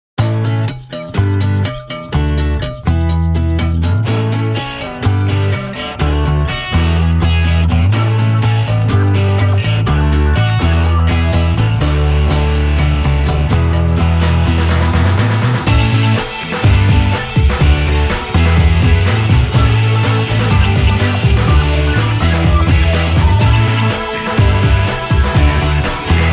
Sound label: cowbell version; Mackintire